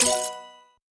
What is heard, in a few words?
wild first; Dolph Dive